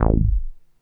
NASTY BASS

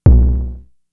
FX, Drum Machines; Fricke Schlagzwerg

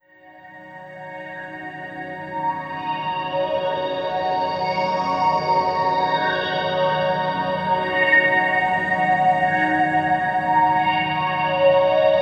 Bright Space, Pads; Sonic Foundry (Sony Creative Software); Slow Silhouette

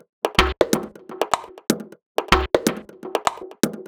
Top B 124bpm, House Drumloops; Zero-G House Fabrik Drumloops

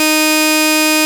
Pro 1 SAW EB5, Vintage Keyboards; Big Fish Audio; First Call

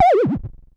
Perc Synth Raw

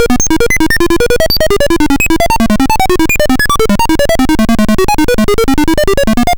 Apple II FX Thinking Computer, Chiptune

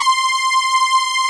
SYN BRASS, JV 1080; Pro-Rec - Synthsonic

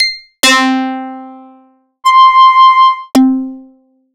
Casio VZ10M Leads and Keys

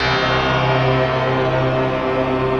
rough stack; Optical Media International; Sonic Images Library

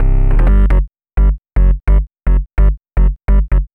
Bass, uk garage, 128bpm

